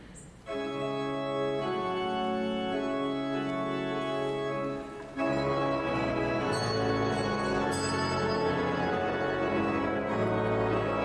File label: Sanctus, Carillons